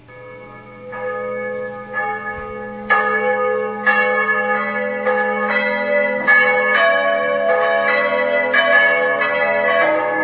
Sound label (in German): glocken alt